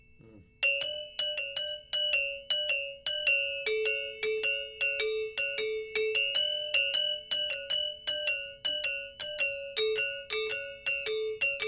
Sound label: gamelan; Goak Maling Taluh Polos